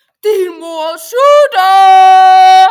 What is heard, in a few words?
yipieee